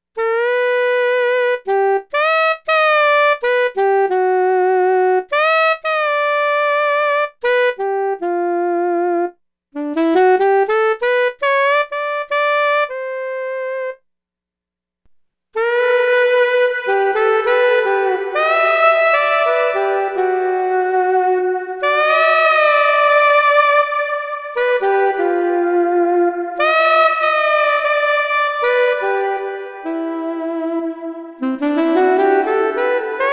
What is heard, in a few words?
TUNNEL